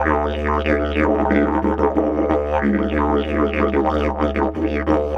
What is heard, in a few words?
Didge 93 BPM, Didgeridoo